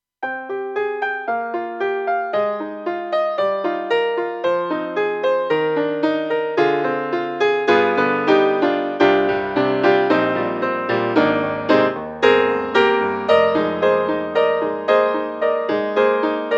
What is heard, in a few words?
AKAI SG01p, SOFT PIANO